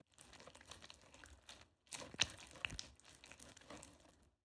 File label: squishy long; soundscrate